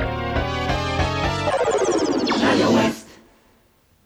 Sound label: jingle